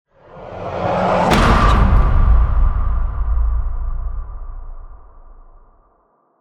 screamy stinger

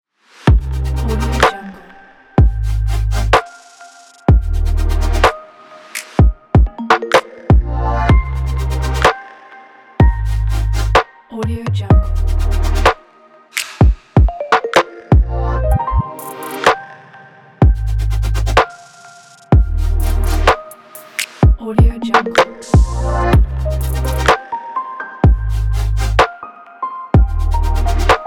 dreamy podcast

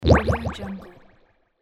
drowning bubble error

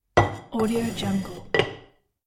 household pot ceramic heavy lid replaced

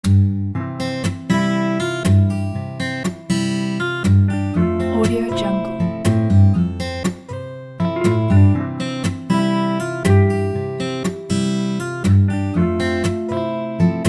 warm and peaceful summer nights guitar

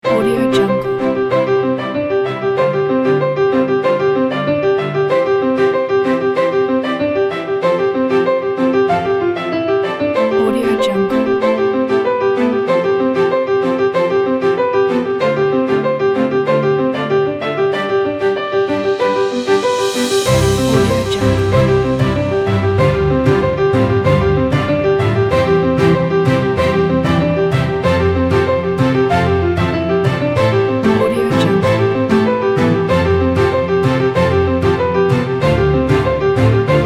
uplifting; stockwaves

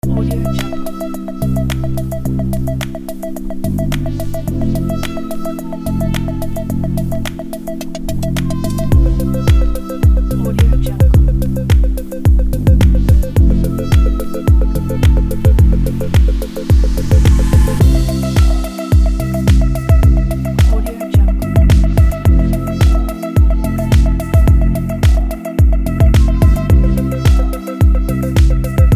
crisp positive digital ambient

